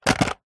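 leisure remote control car remote plastic set down hard carpet; zapsplat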